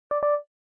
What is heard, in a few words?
failure, phone tones; LG GD570 dLite